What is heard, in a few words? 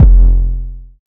808 suckerpunch F, Tonal; Hip-Hop; Slate; CAICOS Hip-Hop Sample Pack